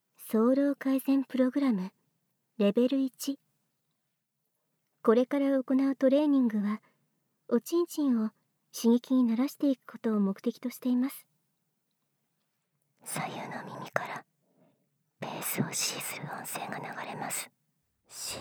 シコシコボイス&喘ぎ, ASMR

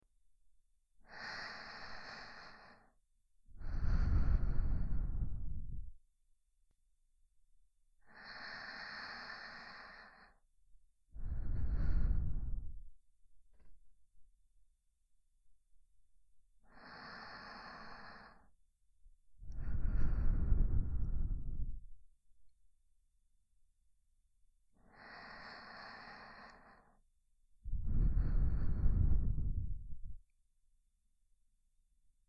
寝息ループ 左; いっぱい甘やかしてくれる優しいお姉ちゃん♪

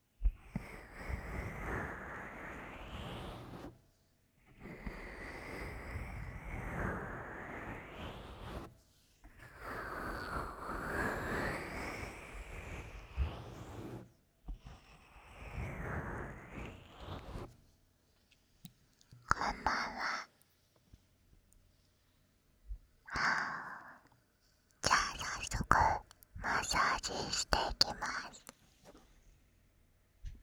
オイルマッサージ

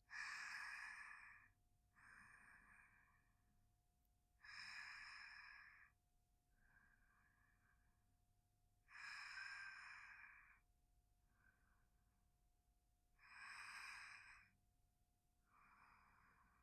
添い寝吐息(寝息); 効果音なしバージョン

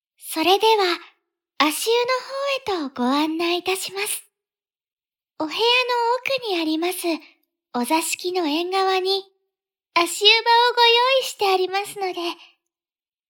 ゆったり足湯で耳かきを

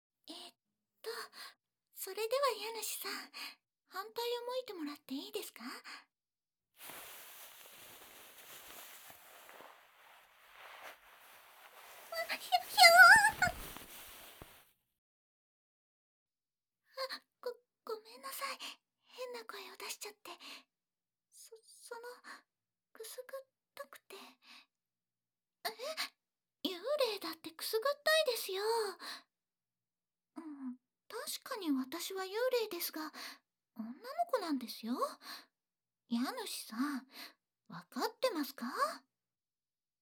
左耳の耳かき

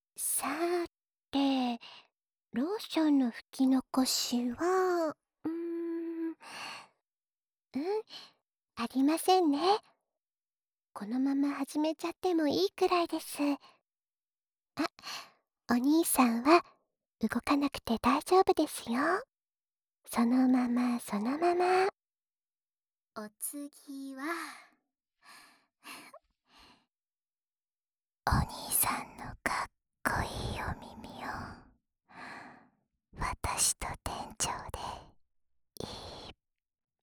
耳奥まで届くエッチなキス、しちゃいます♪, ASMR

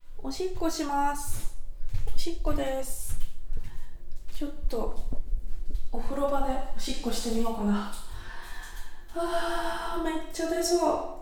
おしっこのみ お風呂場 ステレオ

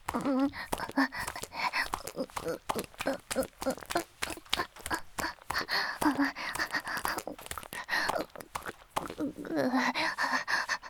雨降り ギシアン ループ; 田舎のダウナー幼馴染の子作りを手伝って…

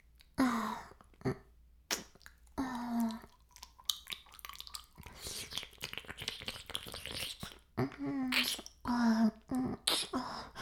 耳舐め音声 LR逆ver, ASMR; 白くて黒い魔性円環

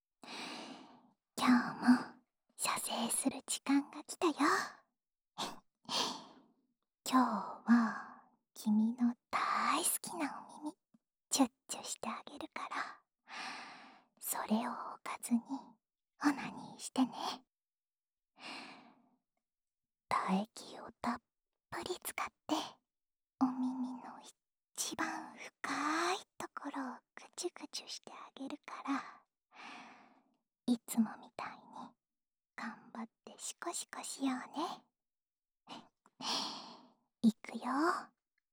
オナサポ用耳舐めループ（左耳）, ASMR

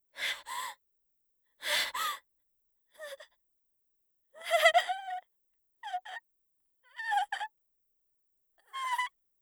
介錯; 効果音なし

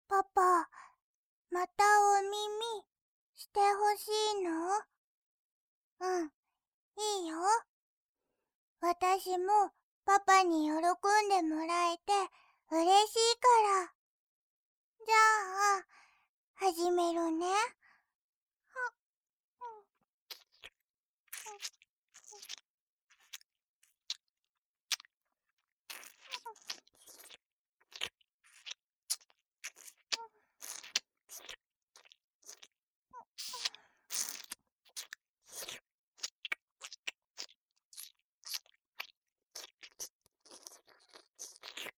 おまけの耳ちゅ（左耳）; 愛娘とちゅっちゅしよ？; ぺーるとーんれいんぼぅすたー

